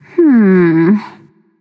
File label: thinking, voicepack; smite; pele